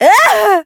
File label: painh; awesomenauts; Dizzy